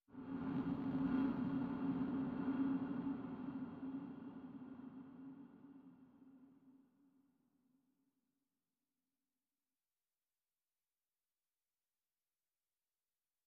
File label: Justice, Noises